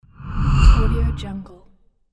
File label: heavy whoosh